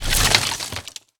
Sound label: debris, grenades; Frag